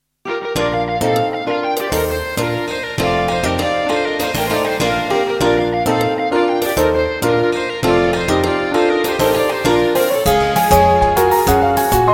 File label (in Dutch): fusion, Een volgende mijnpaal bereikt. Opwekkend; AG fusion